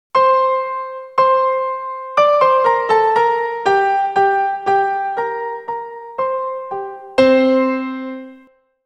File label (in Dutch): electrische piano